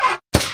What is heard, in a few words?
vent; among us